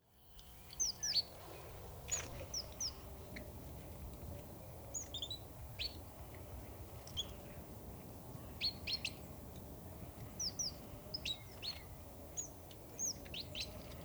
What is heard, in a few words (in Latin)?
Parus major; SETE